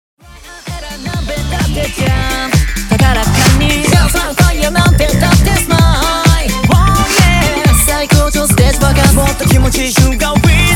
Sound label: Hi Clap